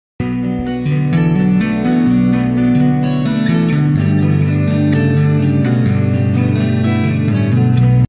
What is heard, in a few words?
Chapman Stick